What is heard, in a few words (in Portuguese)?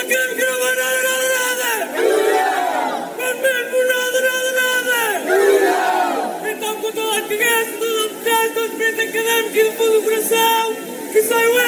Grito Academico